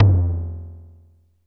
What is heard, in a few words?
LOW TOMS, DRUMS; Music Store Sound Service; Einstein